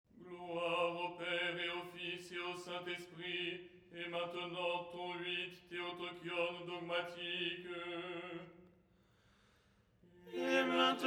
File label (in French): tenor